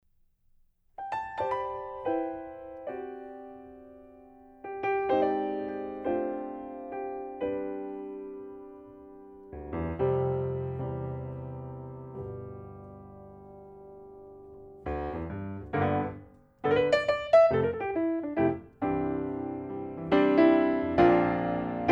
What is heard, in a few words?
Lewitt LCT 240 Pro piano; студийным микрофоном